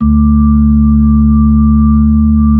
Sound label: B-3, Brite; Keyboards of The 60's and 70's